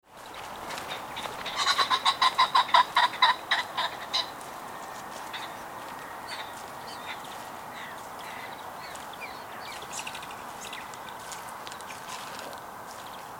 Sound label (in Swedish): Fasan